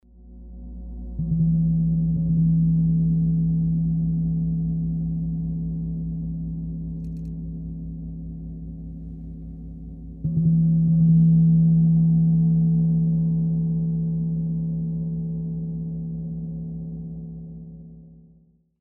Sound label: Gong Bell